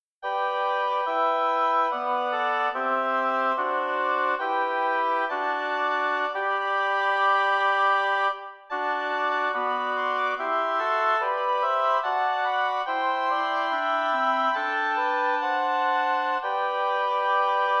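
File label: lyrical oboe quartet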